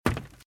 Footsteps - Wood - Creaky - Run - Left

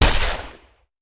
Hand Gun; Sound Ideas; Guns

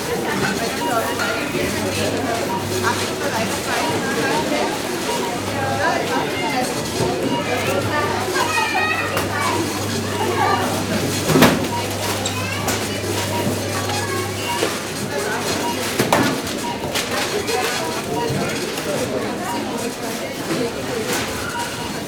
supermarket cash desk; best service Blue Box

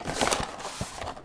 Cardboard Popcorn Box Open; SFX Kit; Foley - Misc

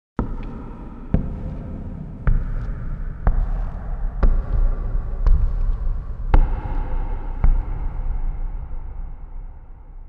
Footsteps Wood Reverb; Inquisitor 3

